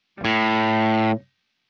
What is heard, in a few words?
spdif reamp